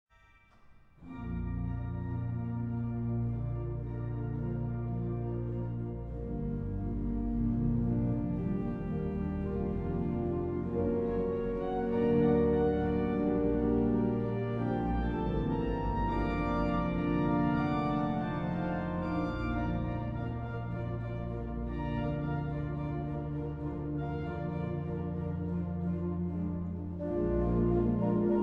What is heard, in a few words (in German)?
romantische orgelmusik